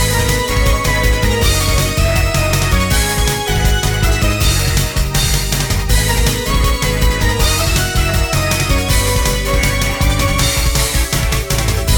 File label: Weapon Get (Loop); mega man x4 1997 saturn gamerip